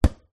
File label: sport soccer ball bounce in small internal space